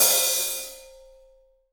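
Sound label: HH, hi-hat, Real-Kit; 90 s Sample CDs; Sound & Vision - Gigapack I CD 1 (Roland)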